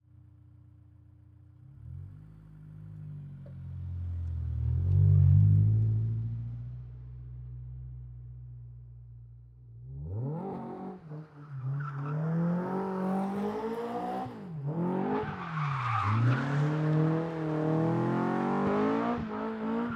Honda S2000, EXT, CIRCLING, Up By Away x3, Wide AB